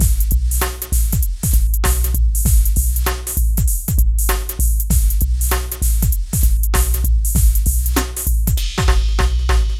PHATT BEA, Hip Hop; E-MU Formula 4000 Series Vol. 1; Hip Hop Nation; PhattBeats95-99

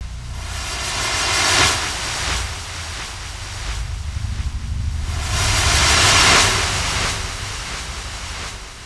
Ambient FX, Ambience FX

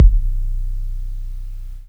KICK, Hip Hop

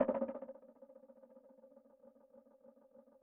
Spirals, Loops; Yamaha DTXpress-II

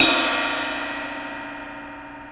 Old Ride, Cymbal; KJ Sawka; Drum n Bass & Beyond; Drum Tool Box

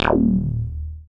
SWEEP BASS; Masterbits; Dance Trax

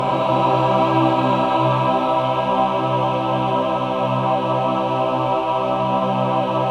MAJ CHORD C4; Spectrasonics; Symphony of Voices

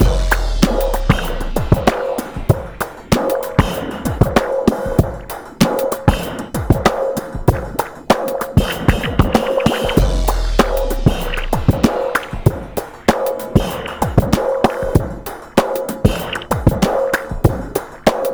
96.3 bpm, Breaks; POTBreaks

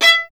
STR VLN, Solo Instruments; Miroslav Vitous

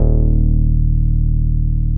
D#2, Bass; E-MU Producer Series Vol. 6 – Analogia Project (CD 1); Boom da Bass 3

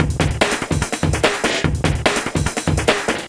LOOP, Eurotech; Sounds Good

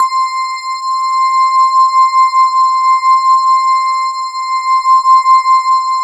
E-HARP, DX HARP; Wizoo - Powered DX

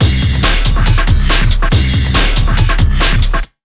TECH 140, Big Beat Drumloops

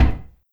Oneshot

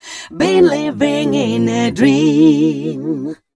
f mel e flat, fem Vocals

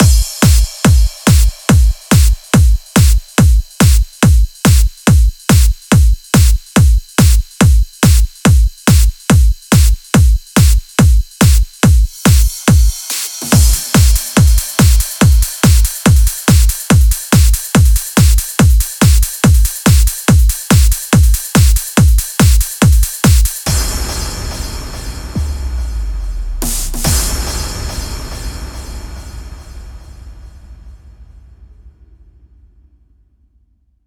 PWM Kit 142 BPM Drum Mix 16 bars; Vengeance - Essential Clubsounds Vol.5; VEC5 Demo Constr Kits; Root Gmin; Drums & Perc

